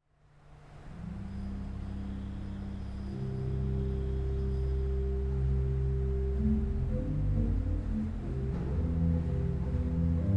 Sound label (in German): Orgel, pfeifenorgeln; PED-Subbass 16'+Gedackt 8'